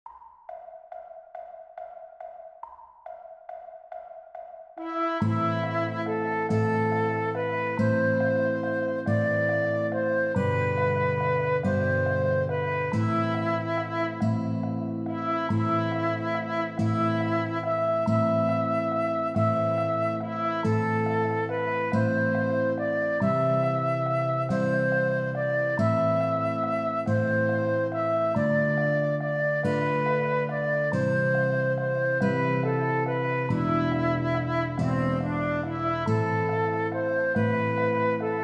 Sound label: tin whistle; High Barbary; Seemannslieder